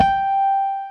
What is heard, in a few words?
JV Rhodes; Pro-Rec - Synthsonic